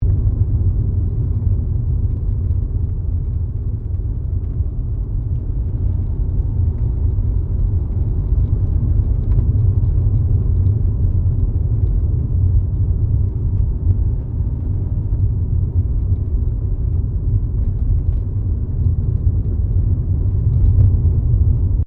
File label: CAR SUBARU LIBERTY INTERIOR DRIVING LOOP; Subaru Liberty 20 01; Family members Subaru; Vehicles